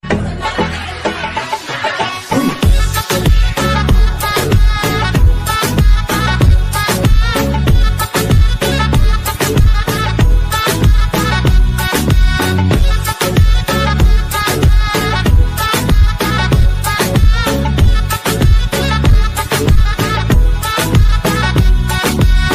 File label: Attiude Music Ringtone; ringtones